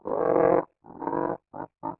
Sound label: SHAKEHEAD; mahlendur